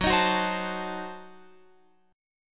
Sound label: F sharp Diminished 7th F sharp Guitar Open F; F#dim7 strummed; x,13,15,13,15,16; chord shape